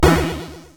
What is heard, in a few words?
SFX; Narco Police